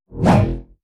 beam spear, Weapon

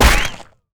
Gun Skin, Damage

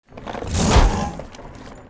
TWEEZER WAR ATTACK

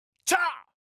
attack; BELMALE

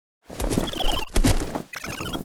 THORNASH PEACE IDLE

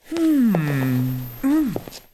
Metal elf Archer peace idle

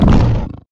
VAFERREX DAMAGE

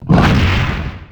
war attack; DAGAN; Mob

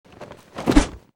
attack; paimon

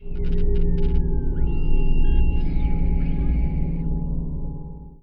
Machine Venter, ambience

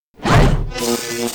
war attack; Heller; Mob

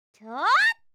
FEMALE attack

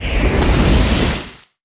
Rock Blade

